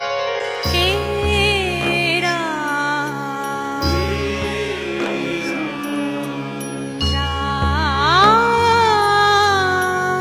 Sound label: Ringtone